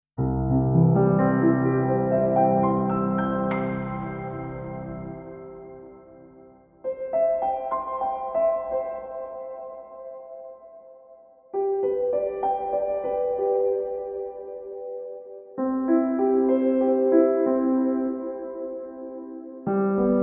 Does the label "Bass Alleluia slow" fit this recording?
No